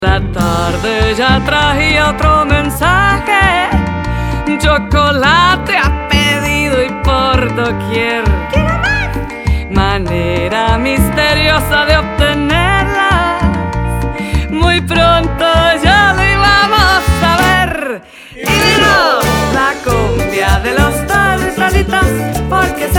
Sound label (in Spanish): cumbia